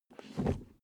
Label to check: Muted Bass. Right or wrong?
Wrong